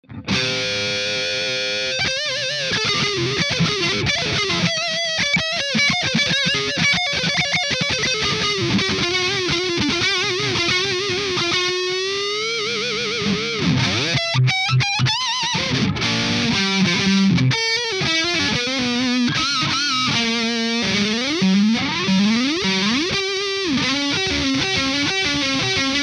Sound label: walk solo